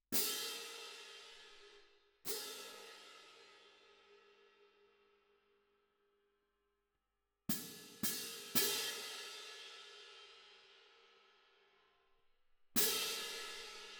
Perc

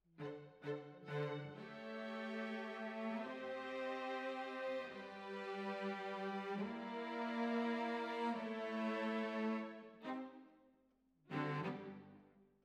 Cellos Over

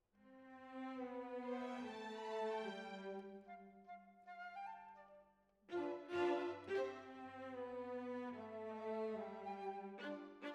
Violas, jazz for piano

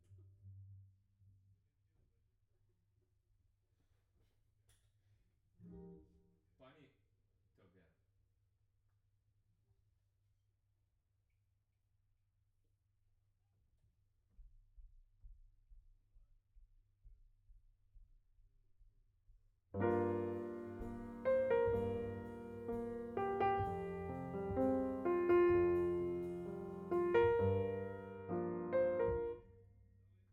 Piano B

